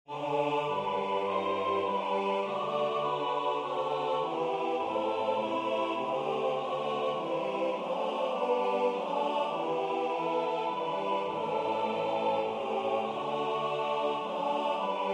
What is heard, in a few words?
SATB; De dag door uwe gunst ontvangen; scholefield